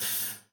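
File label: hydraulics tow truck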